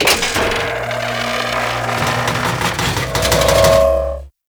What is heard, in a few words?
Thumper Bot Emp Charge; Warframe; Grineer